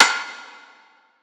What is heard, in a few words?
perc cracker, Drum One Shots; SD MIDNIGHT Sample Pack; Slate